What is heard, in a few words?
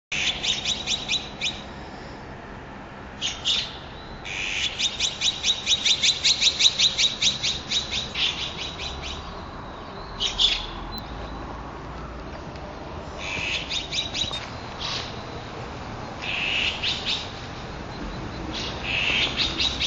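onaga, long-tailed tit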